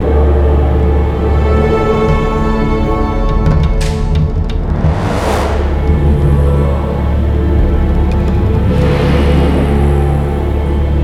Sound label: Siege Field Melody; Ninjago Monastery; lego universe macos windows gamerip 2010